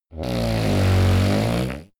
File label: monster snore short low